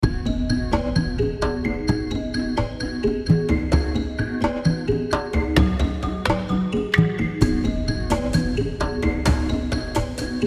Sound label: African Night